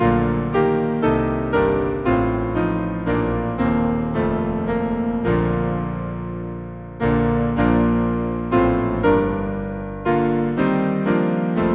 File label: piano, Hymn; What thanks and praise to Thee we owe; Deus Tuorum Militum